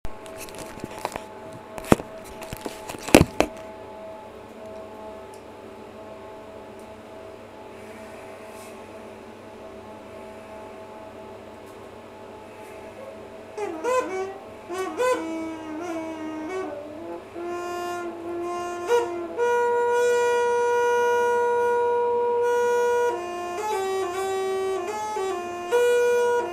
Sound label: bagpipe training